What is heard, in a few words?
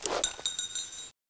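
grenade cock, weapons; cstrike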